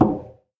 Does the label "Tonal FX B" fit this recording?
No